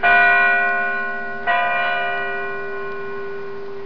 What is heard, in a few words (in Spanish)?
campanas iglesia